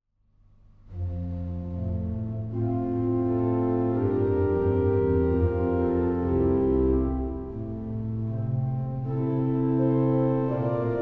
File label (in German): Praeludium, orgel; Aulfingen